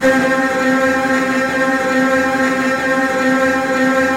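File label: STR TREMOLO, Virtuoso Strings, C3, right; ILIO; Kirk Hunter Virtuoso Strings VOL-3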